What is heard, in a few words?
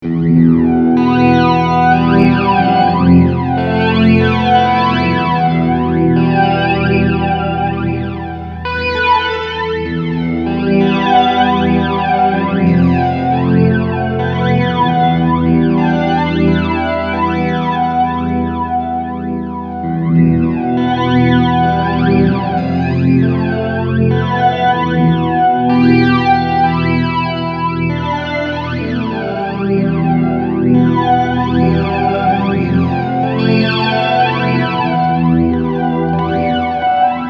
Flanger Clean